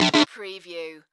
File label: Electric Zap Double